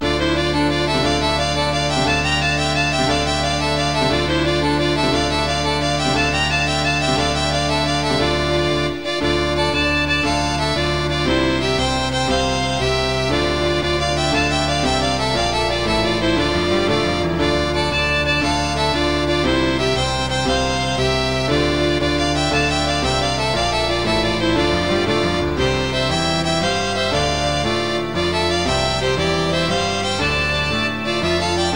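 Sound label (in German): westfälischer tanz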